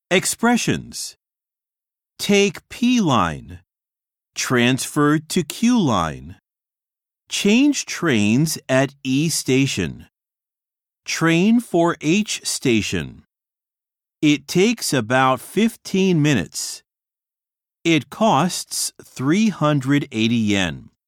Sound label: harmony; 新課程英語教科書 指導用音声CD